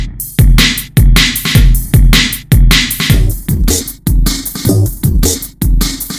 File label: Beat